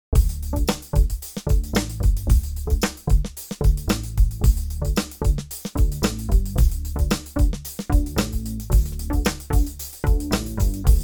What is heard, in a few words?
Slow Sweep Bass, Roland JU-06; SoundDiviner Project; Roland JU-06 Pack03; DigiD bass pack